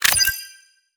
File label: ui player ready